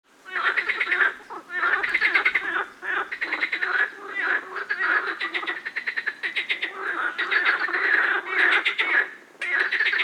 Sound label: Singing birds and croaking toads near a small lake